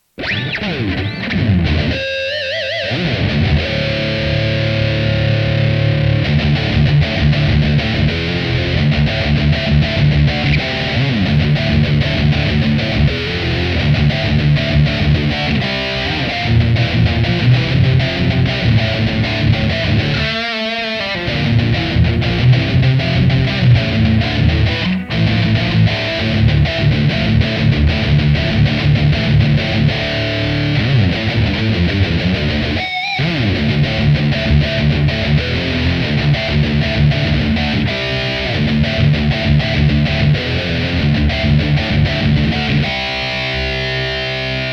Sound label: NuMetal High Gain